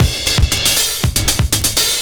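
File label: CYMBAL, 70 s Breakbeats; USB Soundscan vol.46; AKAI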